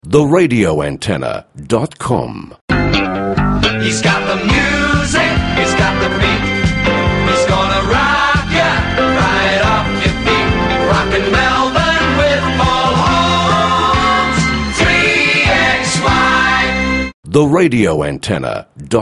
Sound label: jingle; 3XY